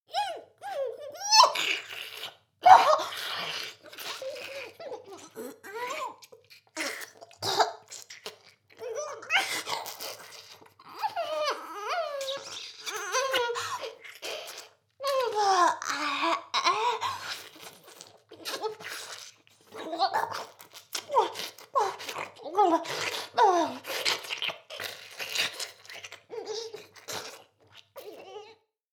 フェラ; 全妹計画２; 【メスガキ妹】わからせてくれるのよねぇ～?おにぃ～・ちゃん♪【全妹計画<オール・シスターズ> 2妹め】

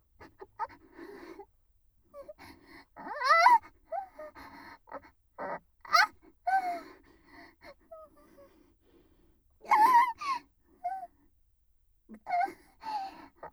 スパンキング喘ぎループトラック, ASMR; ルカちゃん

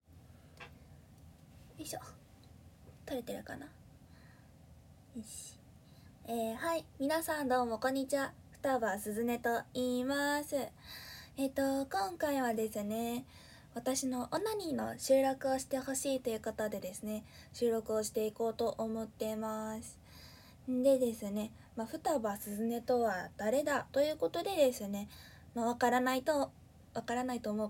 スパンキングオナニー; 【オナニー実演】スパンキング大好き19歳JDのオナニー実演

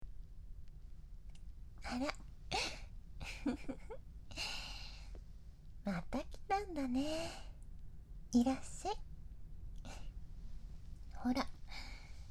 耳舐め特化手コキ; 保健室のセンセイ; ベッド

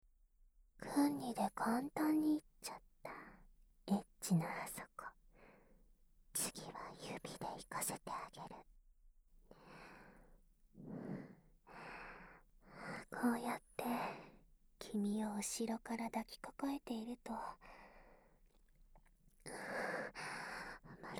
オナニー効果音; 私と彼女のオナニー効果音; 女体化

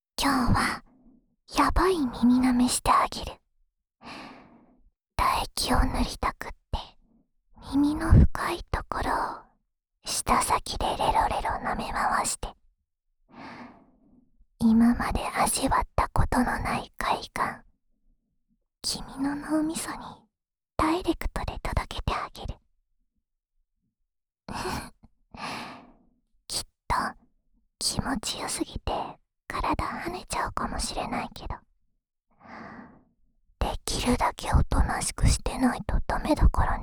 唾液ねっとり耳舐めループ（左耳）, ASMR; オナサポ用; 青春べろちゅー委員会♪～唾液混ぜまくりのディープキス&超絶テクニックで、連続射精の口内恋愛!

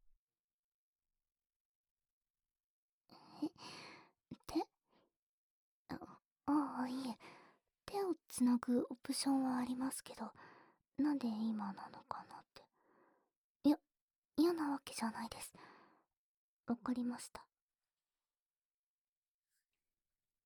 耳舐め, ASMR; 延長するから耳舐め手コキして！

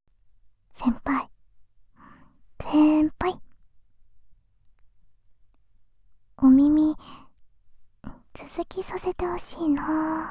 耳かき 右耳; 【ASMR/耳かき/癒し/マッサージ】後輩ちゃんはウブなあなたを癒したい ～デリバリー耳かき屋さん～

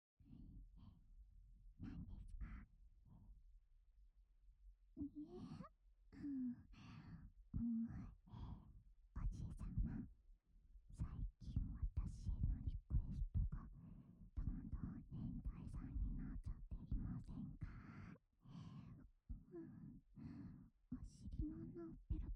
アナル舐め, 壁越し ver; エルフママ・リーリエちゃん

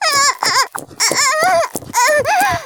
"絶頂"; おまけ(絶頂+潮吹き+中出し ランダム再生); 騎乗位マニアック

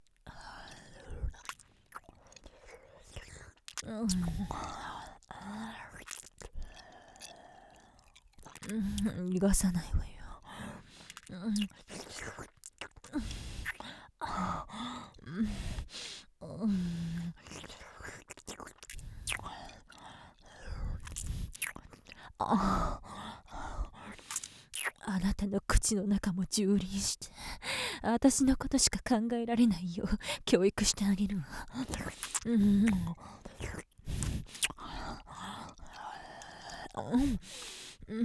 キス; 【マゾハーレム】; 御子柴泉様の間

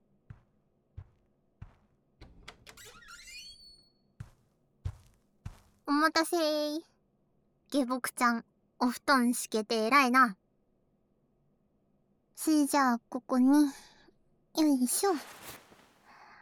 耳かき・添い寝・心音・寝息; るび様の寝かしつけ; 小悪魔天使るび様; G'sこえけんPresents

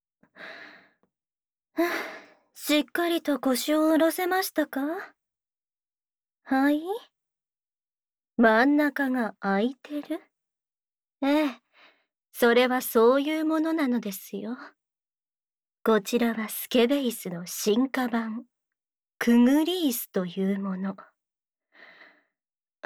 淫魔のすごい口淫; 泳がされる②

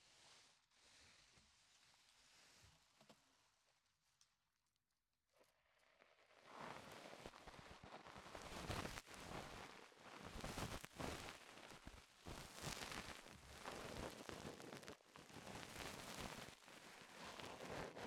耳かき, 癒し音色; おやすみ前のヒーリングASMR

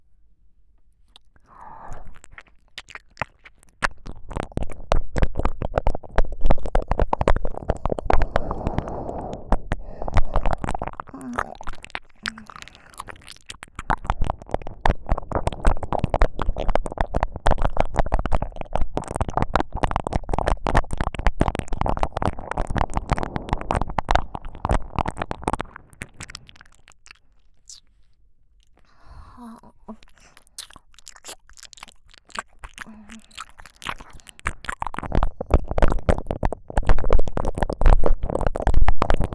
バイノーラルKU100耳舐め; 同人音声; ASMR・お手軽〜高額バイノーラルマイクの差を調査隊!!

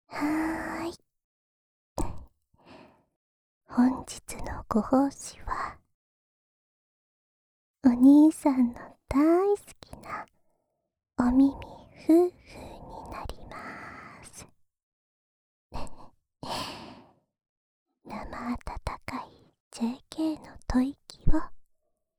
安眠用お耳ふーふーループ（右耳）, ASMR; 一週間、おまんこ係 コンプリートパック

